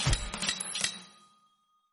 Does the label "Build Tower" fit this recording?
Yes